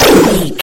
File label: retro weapon gun misc shot